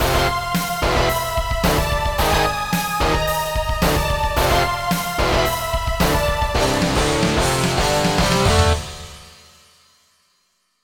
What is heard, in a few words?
Level Failed; Extreme Air Snowboarding; J2ME mobile